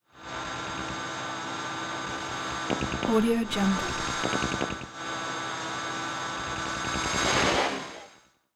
spaceship sonar asynchronous transmissions

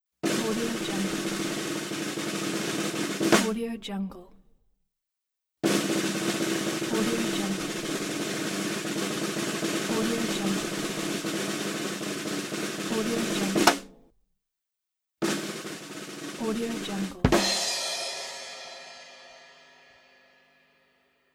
drums roll; mediastockmusic

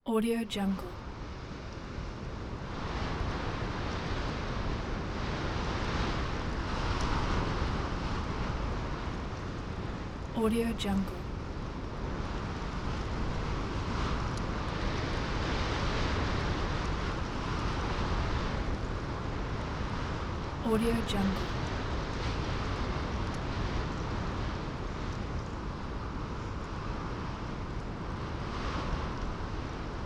winding

